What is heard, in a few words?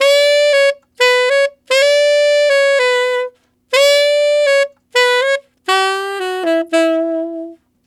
Tenor Rock in E maj, Tenor Sax Loops; Sonic Foundry (Sony Creative Software); Jazz Solos and Sections